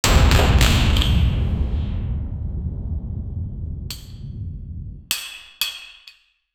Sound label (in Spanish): algo como truenos el eco, castle